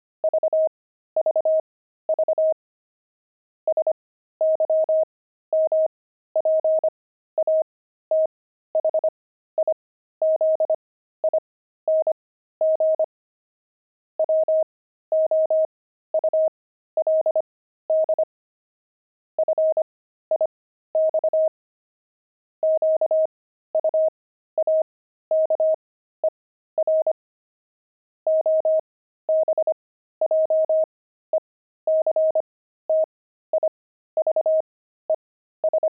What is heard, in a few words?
pangram quaker